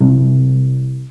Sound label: C string pizz, cello